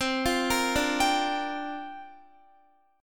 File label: C 7th Suspended 2nd Suspended 4th C down Guitar; C7sus2sus4 arpeggiated